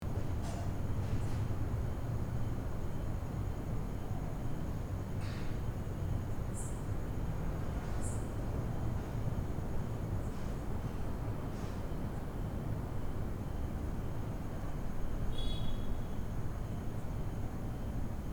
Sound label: Night playground light wind equipment rattle